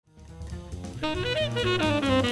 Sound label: trio